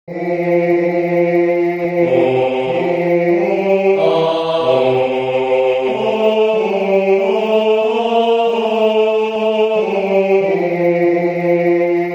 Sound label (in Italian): Venite fedeli bassi